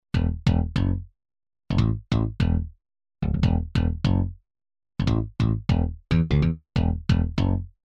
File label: bass lap, Yamaha TG-500